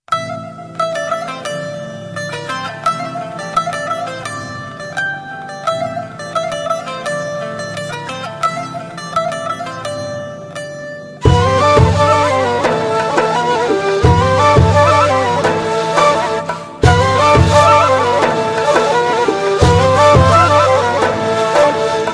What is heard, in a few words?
Kurdi